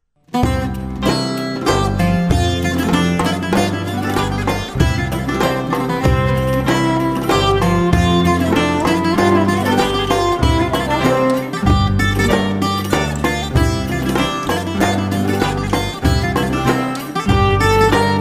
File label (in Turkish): Kordon Zeybeği